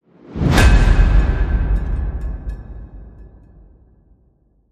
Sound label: Whoosh Hit